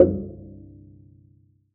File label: Cello D Bass